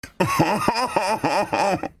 haha

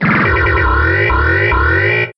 pinball sound

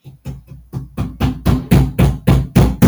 hammer hammer

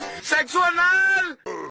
wooooo

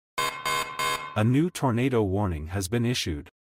tornado warning; max velocity

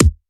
drum hit normal

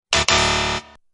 incorrect sfx

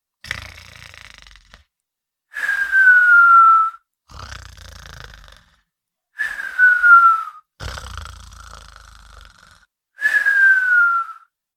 snoring

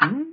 jump; cuphead

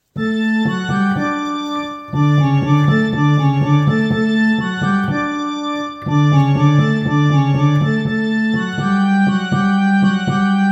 huawei ringtone

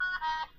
horn tram

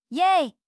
yay; teto

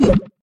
hint

